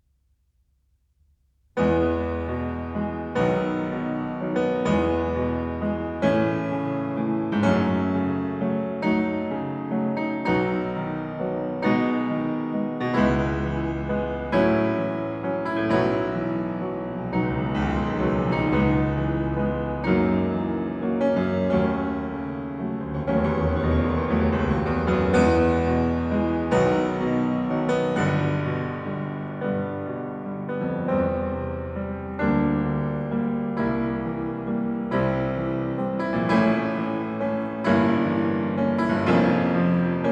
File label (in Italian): Preludio in mi maggiore, op. 28, Piano; Chopin - Piano Works - Vladimir Ashkenazy